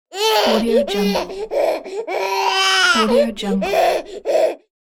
little baby crying

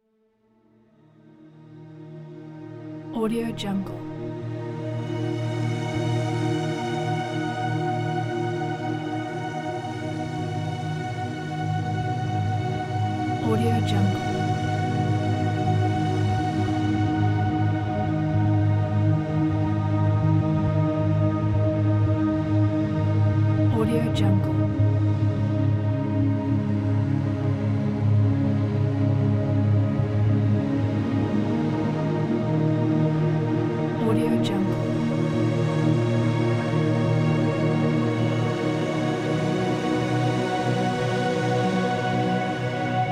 lush strings meditation

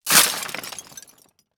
ext debris hits; collanderson

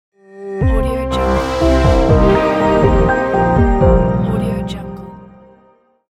soft synth logo; sonicmaniac

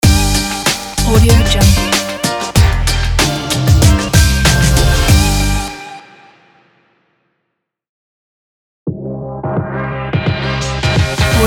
hiphop intro logo; boxbeats

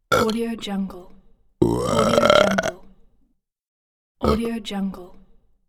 beer burp; teldrassil

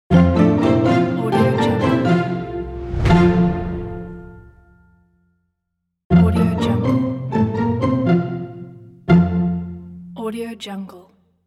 string jingle logo

